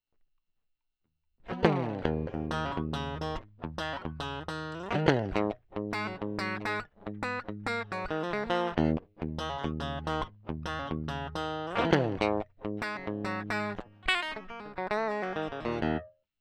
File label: Crunchy Blues